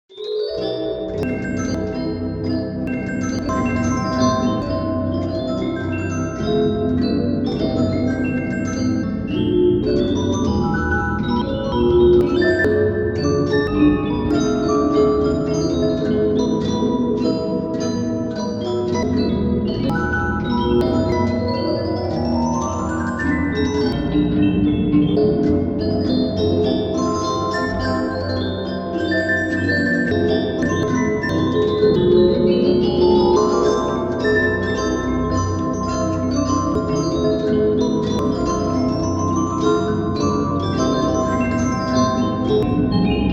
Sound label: Music box; golden freddy; Five Nights at Ronald's